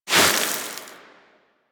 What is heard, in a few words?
Sword Elemental Ice Whoosh